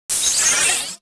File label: silver; Quantum Redshift